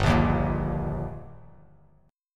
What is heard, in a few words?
G Minor Sharp 5th, chord; strummed; 3,1,1,3; JGuitar